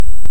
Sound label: Hydrophone; OneOcean